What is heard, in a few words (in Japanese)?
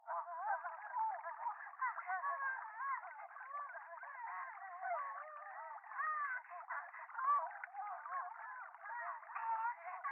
電話 低音喘ぎ 川のせせらぎ